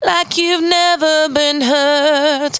92bpm Bb, Velvet Vocal; Zero-G

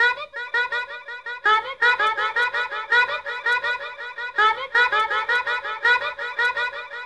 WEIRD RHTM; ETHNIC; AMG; Global Trance Mission VOL-2

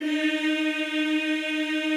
EEH D#3, Extended Classical Choir; Best Service

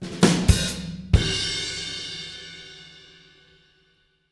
Fills, Monster Beats; Zero-G

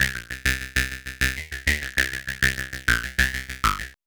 XPANDER, SYNTHS

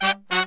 100 AM L, BRASS SALSA

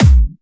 Clubby Kicks, Bassdrums

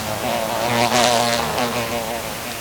one bug, Insects; E-MU Producer Series Vol. 3 – Hollywood Sound Effects; Water

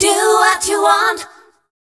FEMALE CHOIR, Dance Vocals

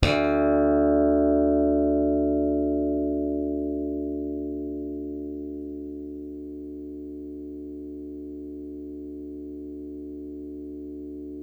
SLAP BASS, Electric Basses

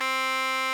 RMI EP; Sonic Reality - Vintage Timetraveler

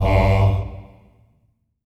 Staccato F G2 R, Symphony of Voices; Spectrasonics

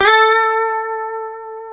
A 4, Guitars; Spectrasonics; Hans Zimmer Guitars VOL-1; 90's Sample CDs; COMP POP+VIB

